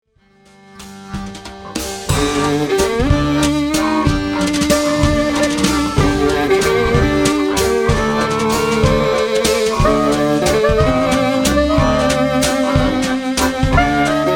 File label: Hungarian Walz